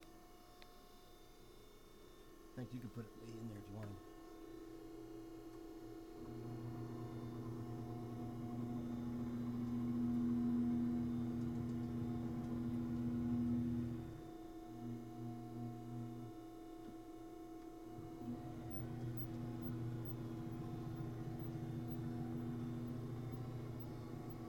Whirr

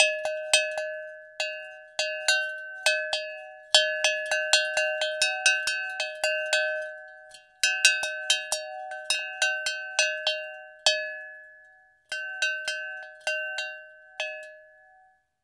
Big cow Mult long

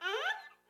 Portamento

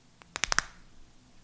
Knuckles Cracking

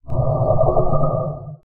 Creepy Sound

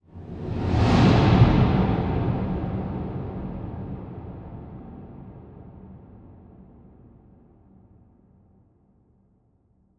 Low Swoosh

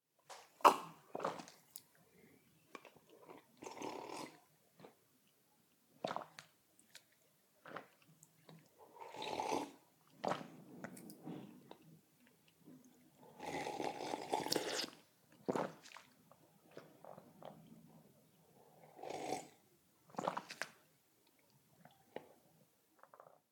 Drinking Coffee

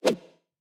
Cartoon Air Swoosh

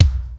Kick

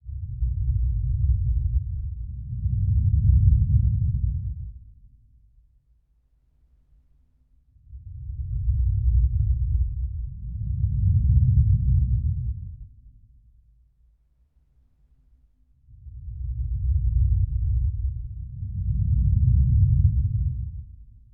Horror Heart Beat 8bpm

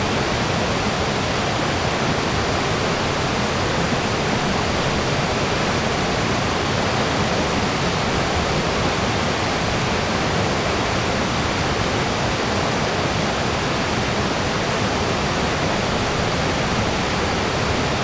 Waterfall Turbulent River Waterfall; Mumlava; ORTF

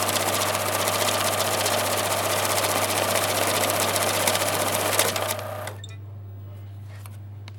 Banknote Counter

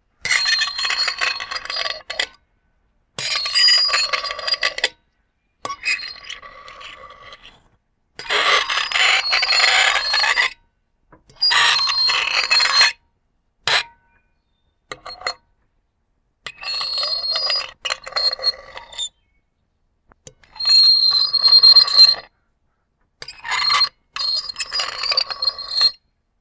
Fork On Plate

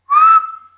Whistle

